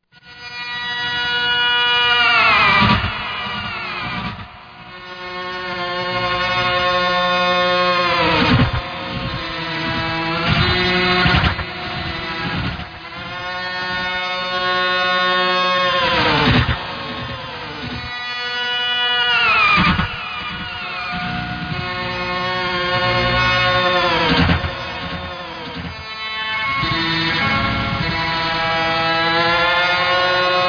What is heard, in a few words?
Lap Steel; D.F. Duck